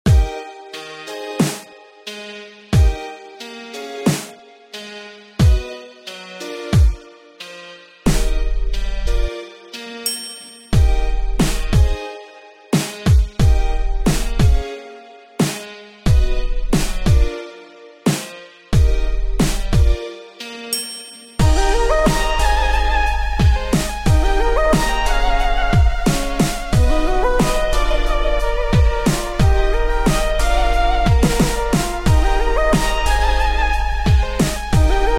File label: HipHop